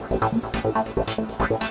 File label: TECHNO SYNTH 140; Keys